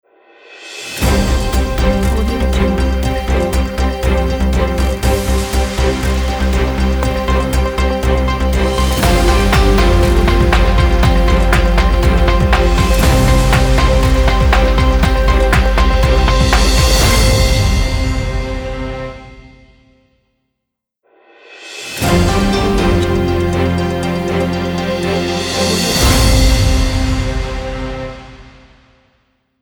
news logo intro

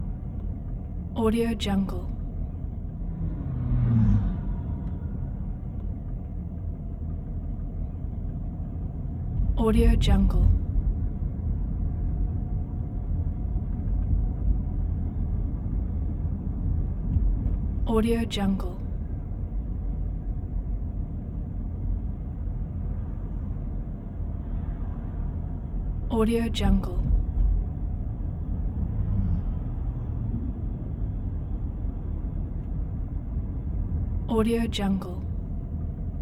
driving residential street; eternal-future-productions

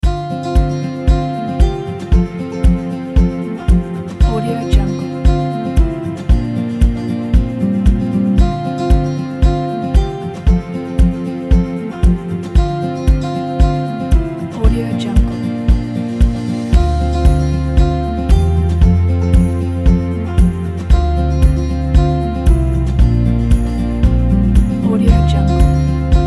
summer folk

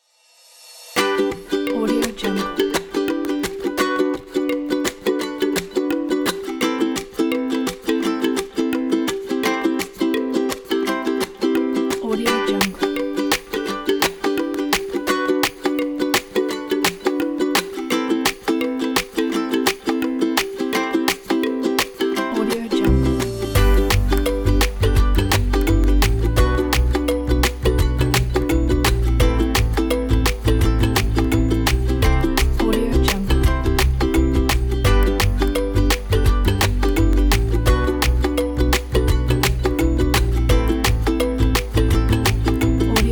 advertising background upbeat ukulele; egor-golovin

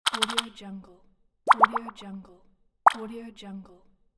message

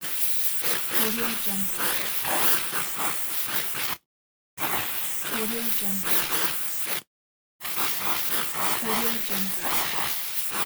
paint spray long

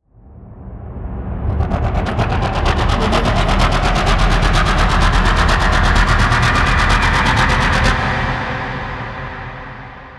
bloody nightmare riser layers; soundmorph

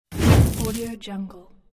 flame

